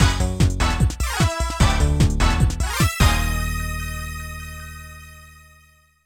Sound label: Defeat; 1 on 1 Government; ps1 gamerip 1999